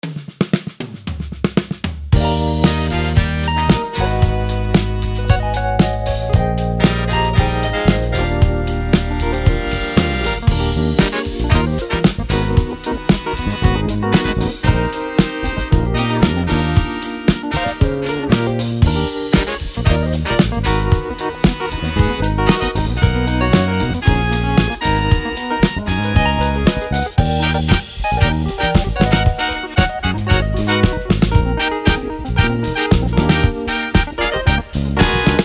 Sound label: Funk Soul Ensemble